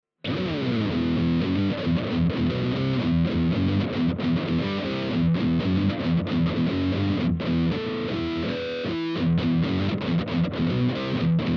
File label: DUAL CUBE Extreme Heavy Octave